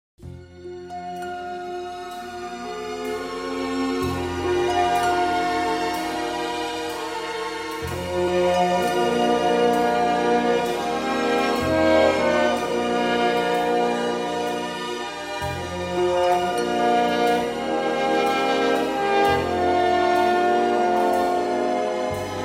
Orchestra

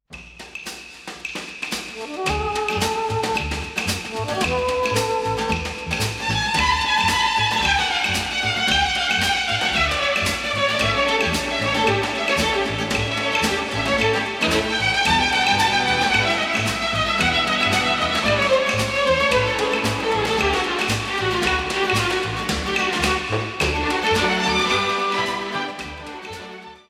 Tropical Violins, Orchestra

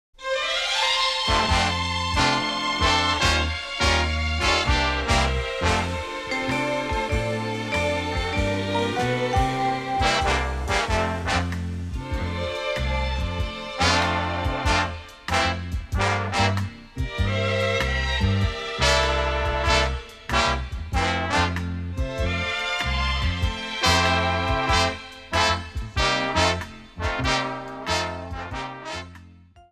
Orchestra

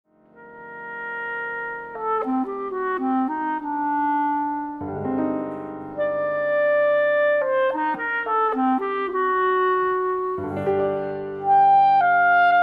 Sonata for Clarinet and Piano

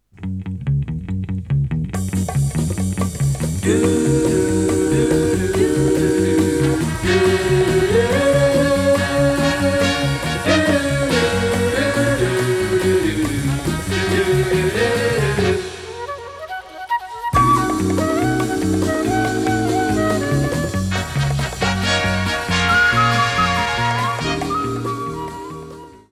The Sound and the Voices

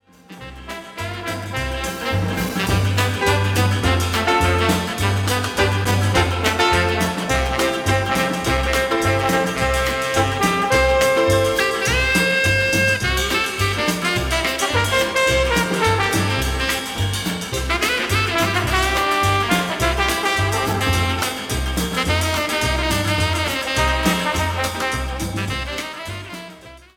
Swing the Blues, Band

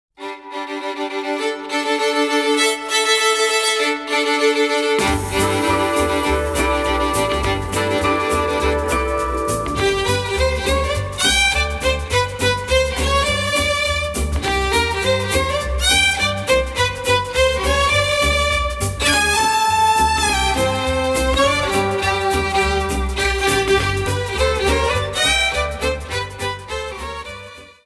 Fiddlers, Orchestra

